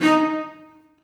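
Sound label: celli staccato d#2; Sonatina Symphonic Orchestra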